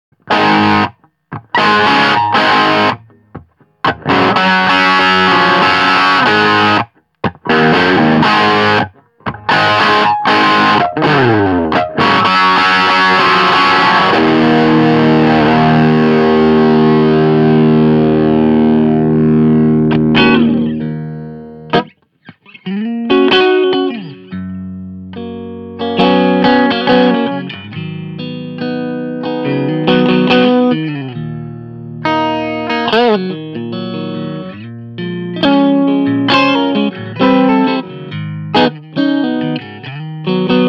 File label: RETRO KING PLEXI 50